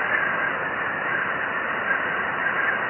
Windl; Buggy; PS1

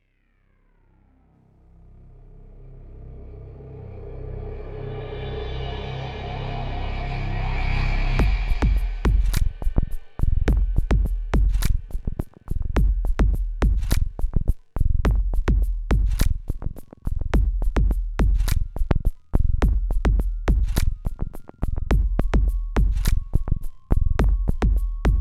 Collide; Payday 2; B-Sides

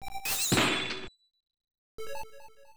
Boot; Air Buster; Arcade

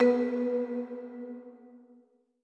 orbit marimba; gamerip; Sky Children of the Light gamerip

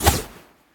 whoosh nylon; Scrat

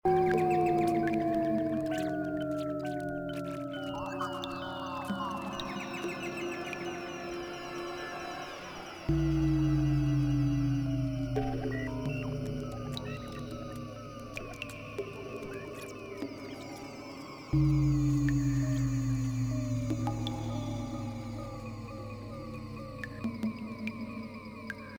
Level sewers; Dr. Maybee and the Adventures of Scarygirl; PSP

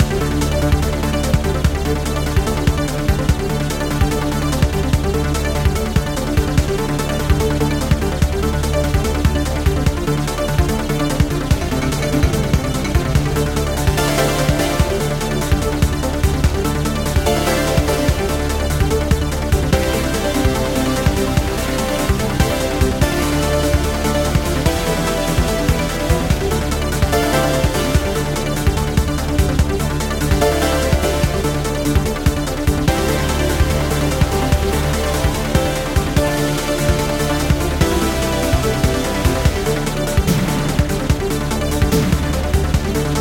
menu; prison run and gun

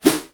Arm Swing, Whoosh; gamerip; shelter 69

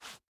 footsteps sneakers cement scrape soft

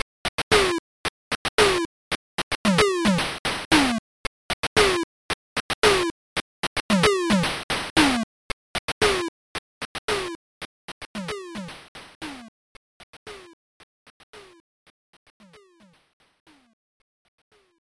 Main Music

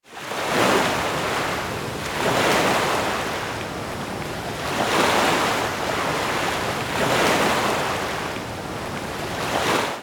Sea; Yu-Gi-Oh! Master Duel

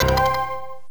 Coin Inserted; power spikes ii arcade

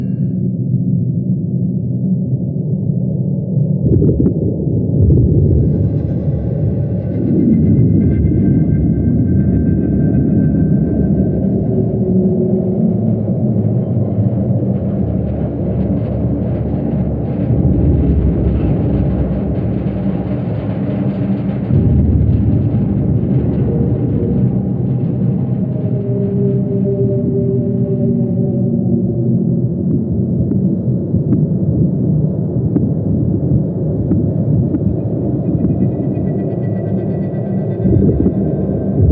ambient mood; Shattered Horizon

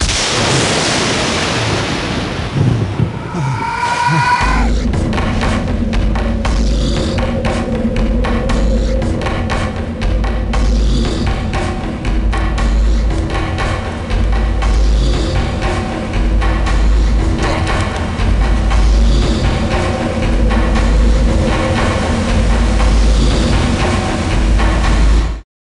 eternal autumn - transition

